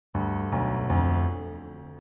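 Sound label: Piano example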